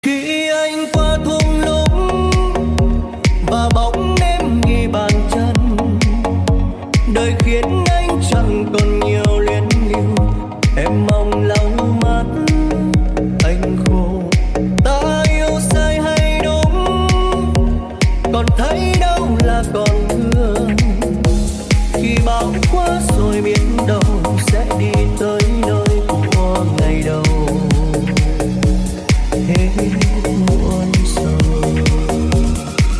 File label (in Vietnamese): nhac chuong remix